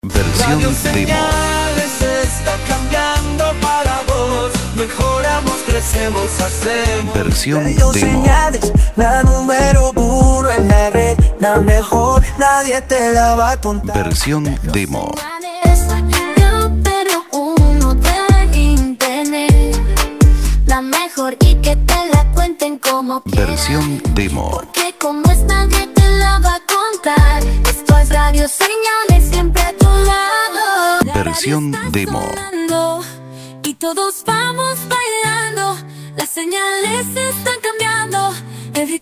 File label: jingles